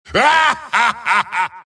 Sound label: wraith laugh; dota; skeleton king